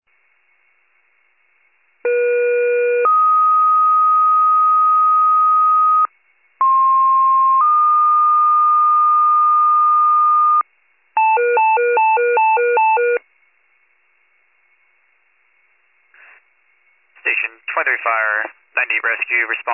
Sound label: fire, pager alert